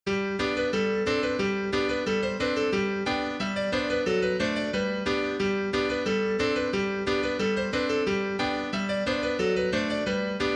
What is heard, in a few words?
piano